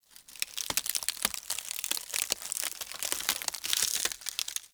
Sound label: Under City Tree Root Bark Crackle Slow